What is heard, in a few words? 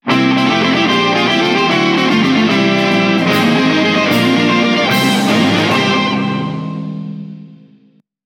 Guitar jingle